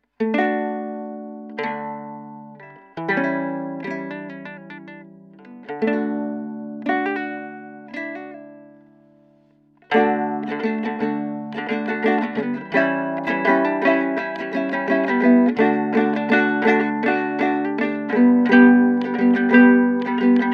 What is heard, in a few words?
solo ukulele; asturias